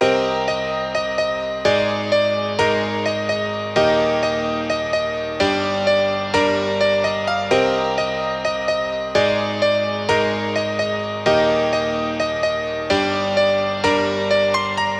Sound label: Piano Loop 128 BPM Cmin; Instruments; Loops; House